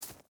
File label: footstep burlap grass jump start; Rust